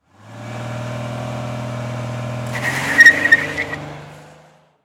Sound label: Car Idle Take Off Peel Out; Power Car sounds